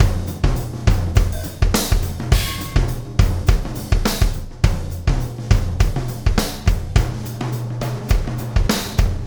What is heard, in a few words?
Wormhole Beat, Processed Drumkits; Sonic Foundry (Sony Creative Software); Zero Gravity Beats